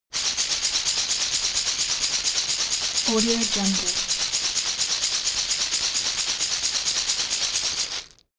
object shaker wood rough rattle slow hard; smartsoundfx

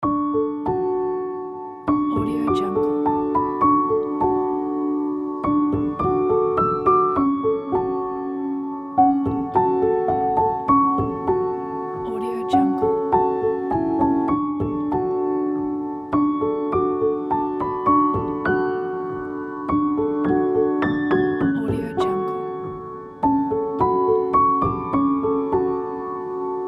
lullaby

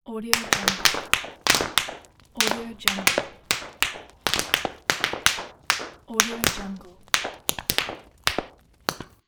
firecrackers; ambientaudiosolutions

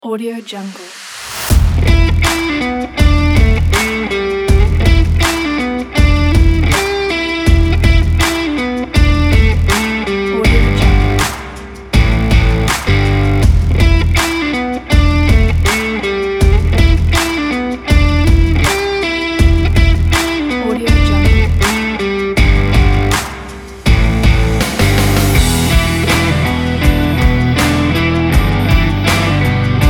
sport rock intro

